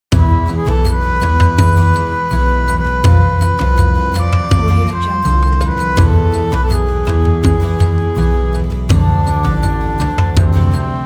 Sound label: arabian night